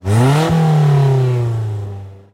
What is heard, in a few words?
Car Motor Engine Rev Engine Perspective